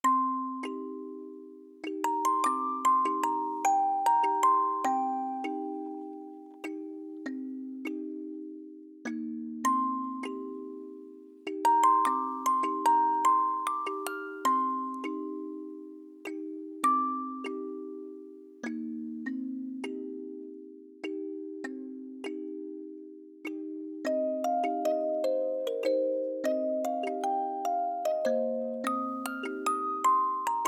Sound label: Music Box